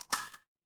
glass crack

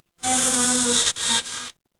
animal insect

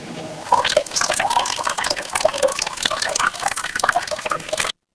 water pour

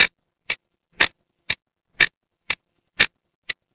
mechanical clock tick

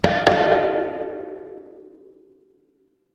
instrument wood block

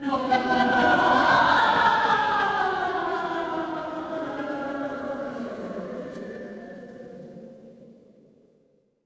crowd laughter

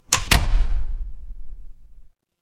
door open

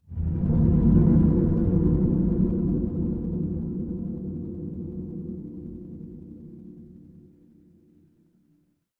horror drone

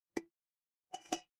glass ceramic

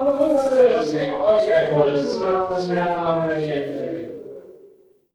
crowd murmur

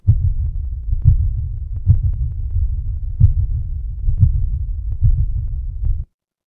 horror heartbeat

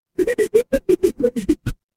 retro game power up

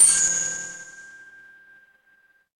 instrument chime bar

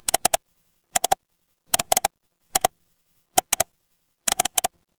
office mouse click

